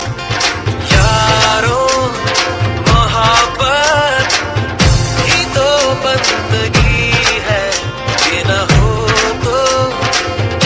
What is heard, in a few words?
Dhol Mix